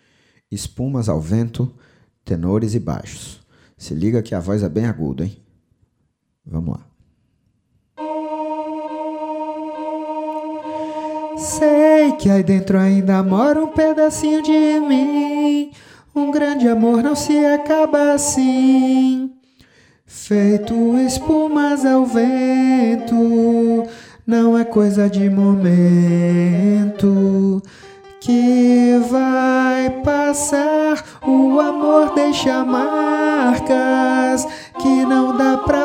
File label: 3v tenor